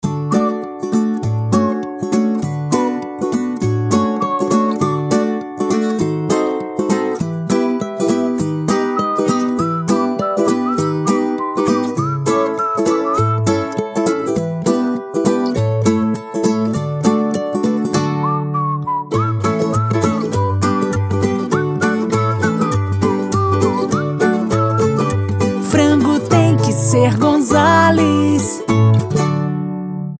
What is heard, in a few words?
jingle